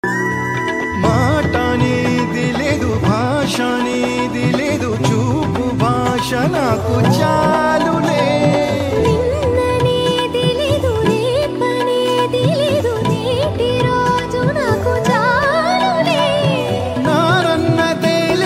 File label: music; love story